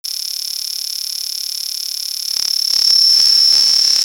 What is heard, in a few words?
Digital Buzzing Rattle Glitch